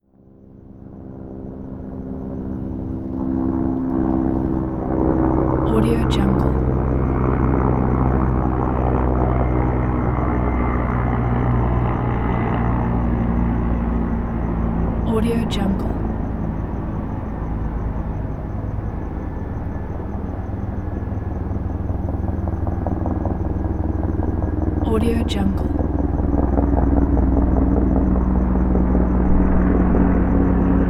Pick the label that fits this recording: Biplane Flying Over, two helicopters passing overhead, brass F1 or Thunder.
two helicopters passing overhead